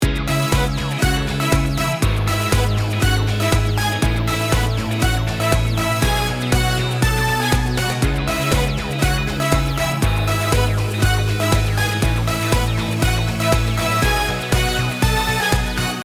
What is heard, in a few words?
Guitar Nocomomix